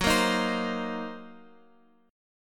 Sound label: Gb 6th Flat 5th Gb down Guitar Open F; strummed; Gb6b5; JGuitar